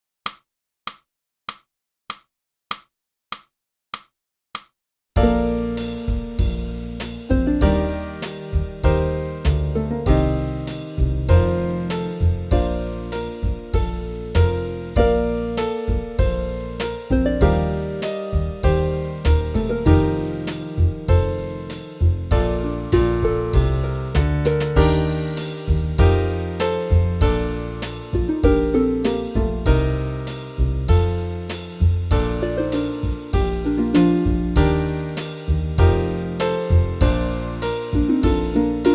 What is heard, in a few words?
sax